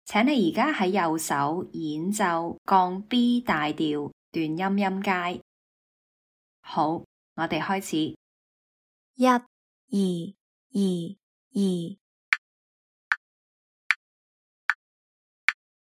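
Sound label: B flat major staccato scale right hand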